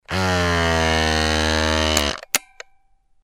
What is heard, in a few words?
vacuum